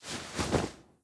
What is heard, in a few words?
Clothes; blue archive